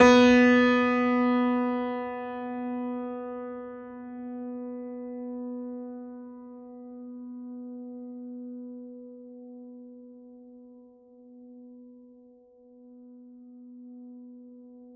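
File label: Classical Piano B2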